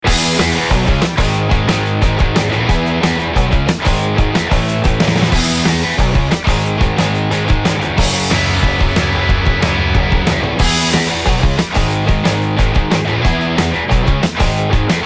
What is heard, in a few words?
E Major Pentatonic